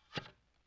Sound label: Plunger Ploink Metal; Vintage Cartoon; Foley